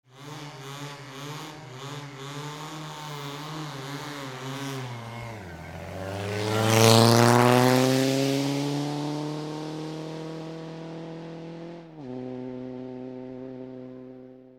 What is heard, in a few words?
bugatti